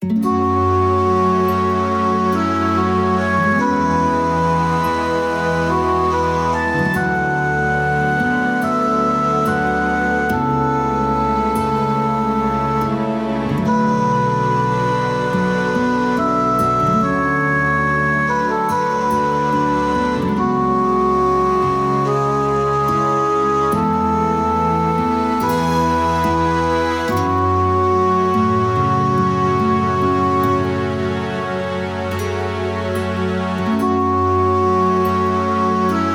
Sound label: Jingle Sad; Taiko no Tatsujin V Version; PS Vita